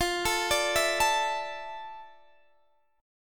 D Suspended 2nd F; arpeggiated

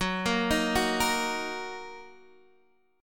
F sharp 6th, down, Guitar, DADGAD

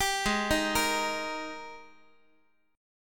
G sharp Major 7th Suspended 2nd, Guitar; x,x,10,6,7,9; JGuitar

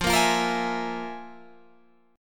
F Minor Major 7th Double Flat 5th, Guitar, Open G

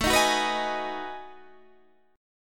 B 13th A, chord

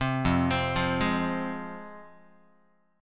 F Minor, F, Guitar; arpeggiated; 3,1,3,3,0,x; JGuitar

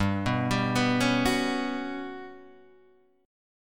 G Major 7th Flat 5th; arpeggiated; Standard; JGuitar

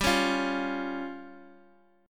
F Suspended 2nd Sharp 5th G

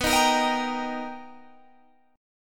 B Augmented Major 7th B Guitar Open G, chord

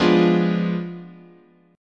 F Diminished 7th, chord

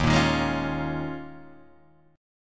D sharp 9th Suspended 4th D sharp, Guitar Open D

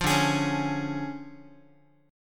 D Minor Major 7th Flat 5th, D, Guitar; 8,10,11,11,x,x; DmM7b5 strummed; JGuitar

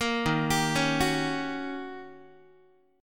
Eb 7th Sharp 9th Eb, Guitar; arpeggiated; 13,11,10,11,10,x; 4A,4E,5A,5D,5Gsharp,6Csharp

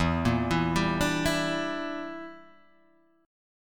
A sharp 6th Flat 5th

